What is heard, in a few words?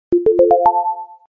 Realme SMS; 11X